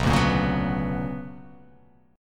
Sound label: B Minor Major 9th